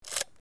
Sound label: Clic